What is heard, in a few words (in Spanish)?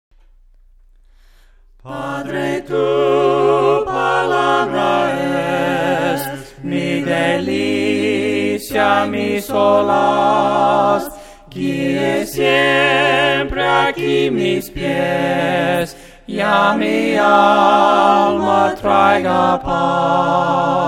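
VOCES, himnos a capella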